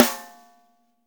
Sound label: snare, dry kit